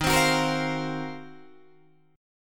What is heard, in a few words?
D sharp Minor 6th Add 9th D sharp Guitar Drop D, chord